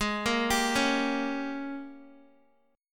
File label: G sharp Major 7th Suspended 2nd Suspended 4th Guitar